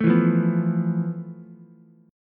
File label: F Major 7th Flat 5th Ukulele; strummed; JGuitar